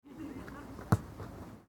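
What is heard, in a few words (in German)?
Fussball Jugendtraining Schuss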